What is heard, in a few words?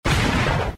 explode explosion exploding sound effect